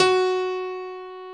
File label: PNO F#3, DANCE PNO